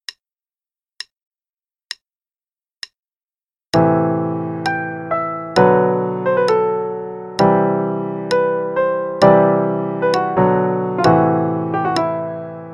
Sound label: Play-along, kybd line, hn=66